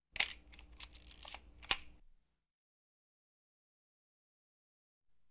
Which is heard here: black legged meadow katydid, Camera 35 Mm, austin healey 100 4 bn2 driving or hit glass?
Camera 35 Mm